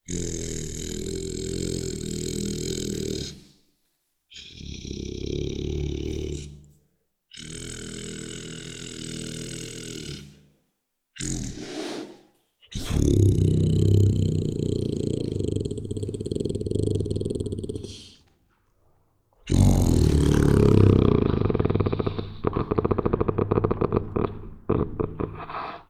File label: Tiger Growls and Yawns; Tigers; Sound Ideas